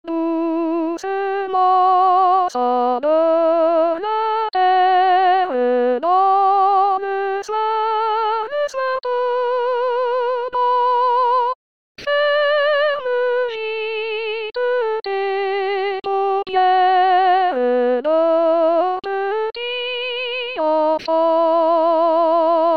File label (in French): berceuse cosaque soprane